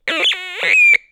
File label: Cracklebox Squeak Sqwawk